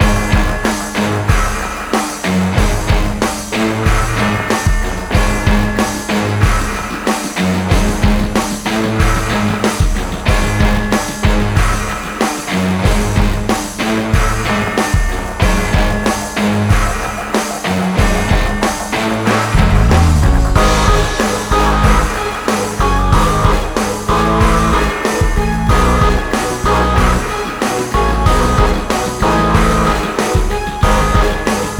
theme, music combat

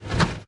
car gearchange

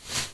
clothes collide; human

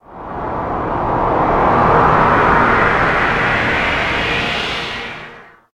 rnd dark, ambient outdoor

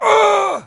wound, stalker

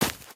grass, human step

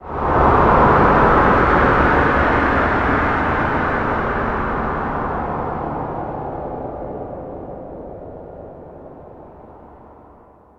rnd dark, ambient outdoor

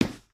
floortile, human step

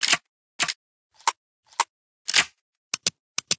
reload, weapons

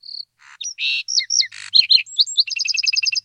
rnd bird, ambient outdoor

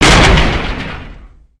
gates knock out; pripyat

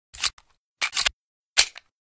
pistol reload; weapons

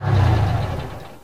apc stop, car

